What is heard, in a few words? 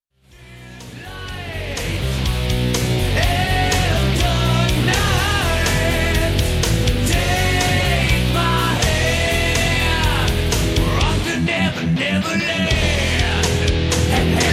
WAH